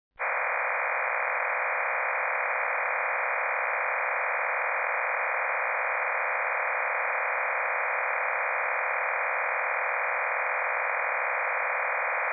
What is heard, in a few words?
MT63 2000 SHORT